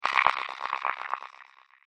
Martian Mandibles, alien sound effect; science fiction sound effect

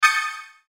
Chime Notification High Strings; Multimedia; Chime Notification High Strings Production Element Imaging Element Accent Transition; Blastwave

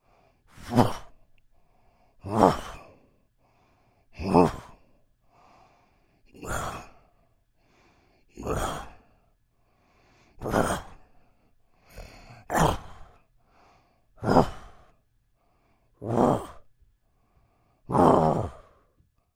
Creature Moan Efforts Series, Human; Groaning; Voice; Big Room Sound

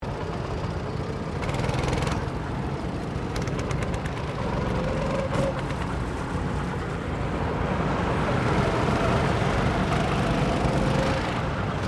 GOCARTS SEVERAL PASSING, Gocart track; Gocart track in Queensland; Sound Effects:Vehicles

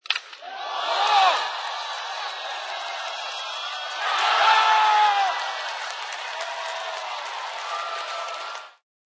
Baseball Hit Crowd

